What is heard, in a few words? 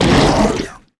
crocs abominor spit eggs